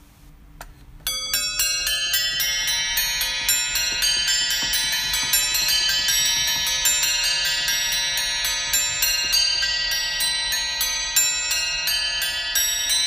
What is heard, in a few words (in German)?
Cymbelstern 8 Glocken